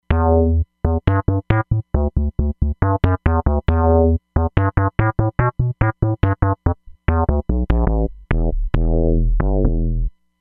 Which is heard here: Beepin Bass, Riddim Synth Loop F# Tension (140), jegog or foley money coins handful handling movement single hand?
Beepin Bass